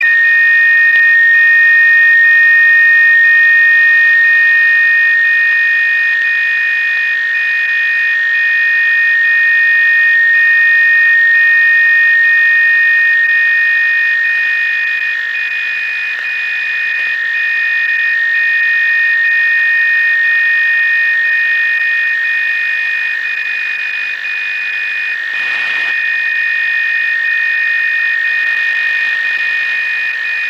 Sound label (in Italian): dcf audio campione, dcf77